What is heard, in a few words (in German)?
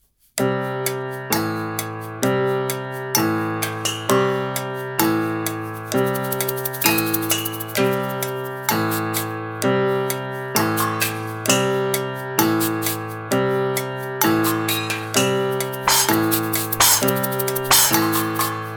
Karaoke Backe Backe Kuchen, kinderlieder